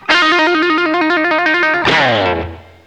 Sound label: GTR LICK; Stereo Guitars; Hip Hop; E-MU Formula 4000 Series Vol. 1; Hip Hop Nation